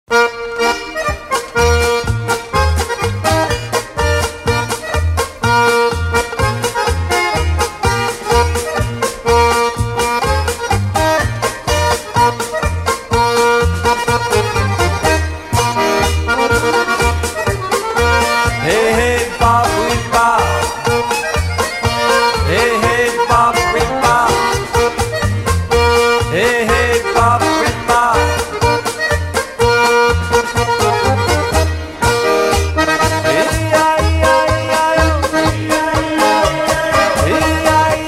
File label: Cafe Polka, accordions